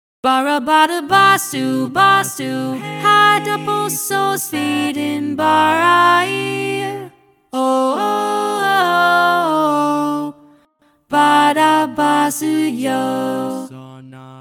SATB Lead, barbershop tags

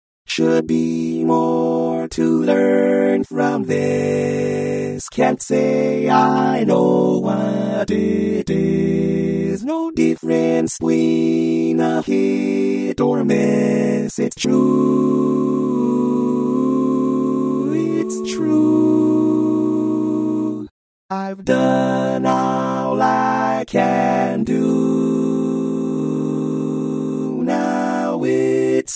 Bari, barbershop tags

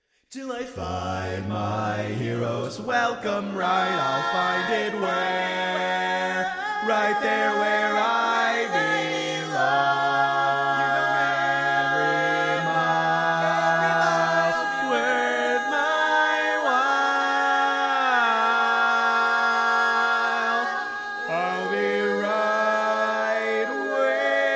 Bass, barbershop tag

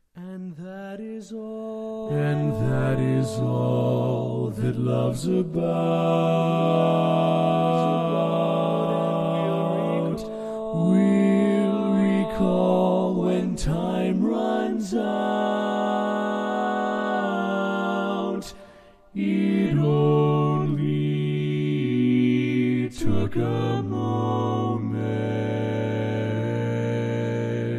Bass, BarbershopTags

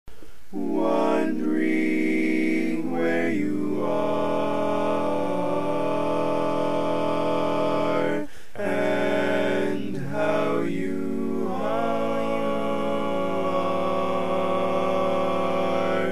Bari, barbershop tags

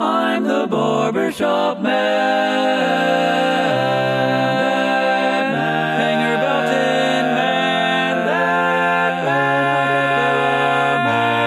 All Parts, Barbershop Tags, TTBB version; Each recording below is stereo - one part on one side, the other parts on the other side.

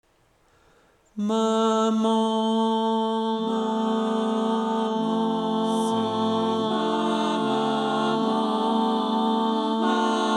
Ma Maman, Lead, barbershop tags

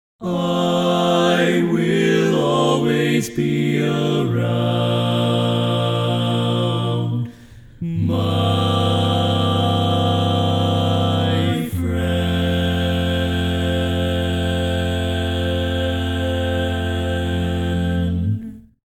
Bass, barbershop tags

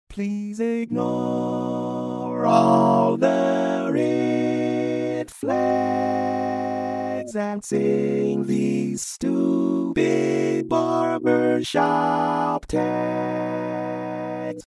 All Parts, barbershop

